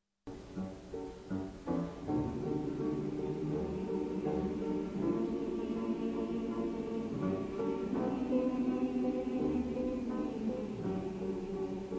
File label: ukrainian song play my bandura